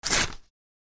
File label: paper tear